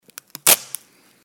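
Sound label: otryv skotcha, tearing off tape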